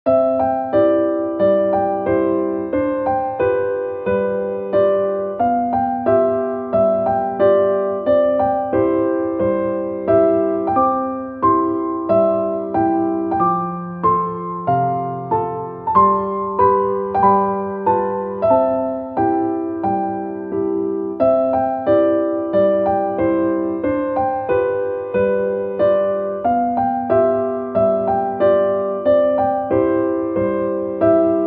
polka piano; classic; stlauss2; annenpolka

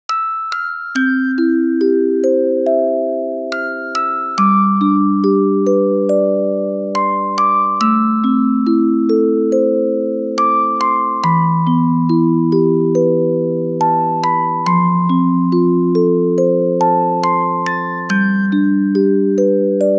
orgel

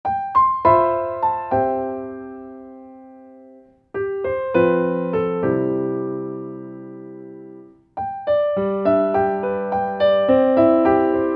piano; 切ない 感情的 ドラマティック; 雨にあたりたい気分の日。負の感情を洗い流してほしい。

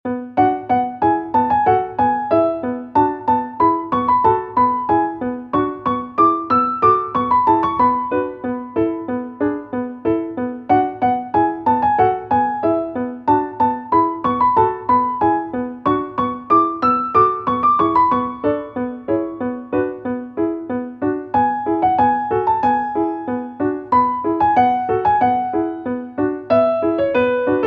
piano; 孤独 しみじみ 軽やか; 自由と共に感じる寂しさ。自分の小ささを知る現状。

